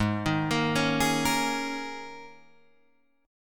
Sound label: G sharp Suspended 2nd Suspended 4th, G sharp, down, Guitar, Drop D